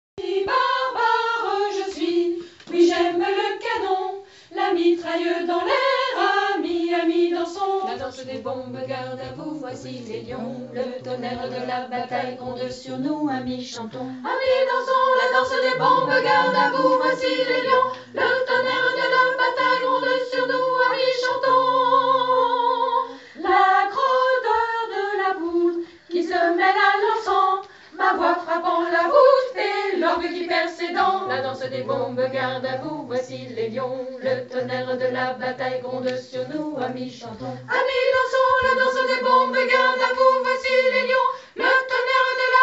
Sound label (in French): Voix haute